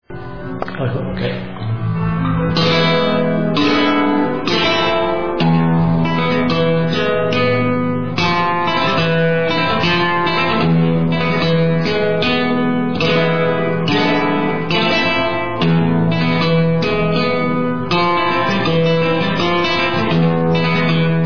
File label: Solea, guitar, Sevilla